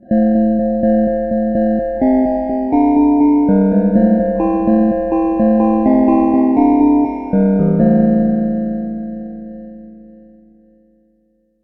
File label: glockenspiel bass